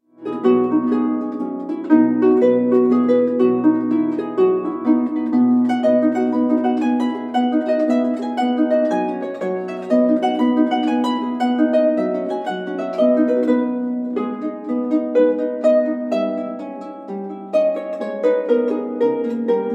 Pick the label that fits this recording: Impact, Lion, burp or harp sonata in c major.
harp sonata in c major